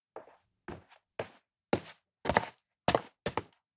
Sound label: steps indoor multiverse hall walk in; anemoi パッケージ版 1.1